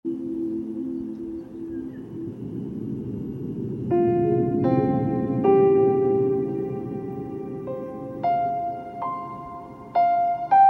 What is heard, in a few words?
Piano Plants